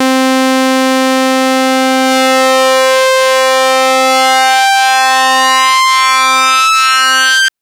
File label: Synth SyncSweep c3; TrashLibrary